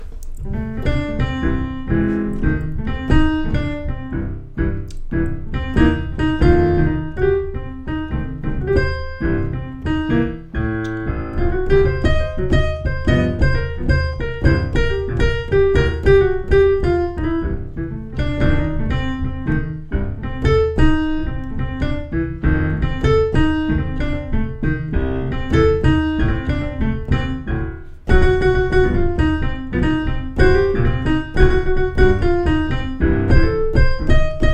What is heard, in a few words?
example rhythms, jazz piano